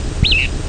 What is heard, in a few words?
alfl weeoo, Empidonax alnorum; Passeriformes; Tyrannidae